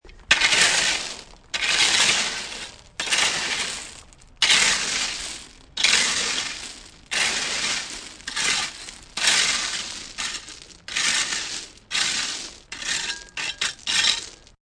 Raking Gravel, Raking gravel with multiple quick strokes and sound of gravel shifting; Raking gravel with multiple quick strokes and sound of gravel shifting and dragging.; Yard Tools